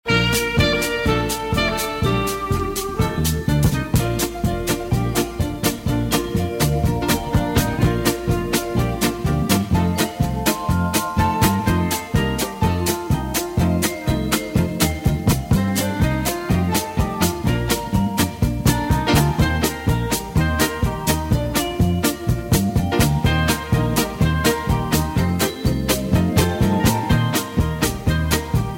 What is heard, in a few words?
music